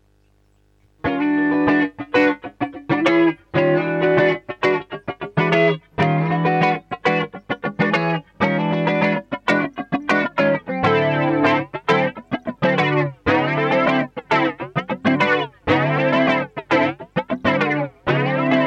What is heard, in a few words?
Funky, flange